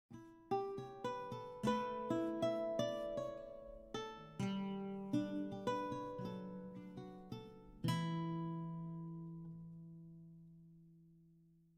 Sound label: baroque guitar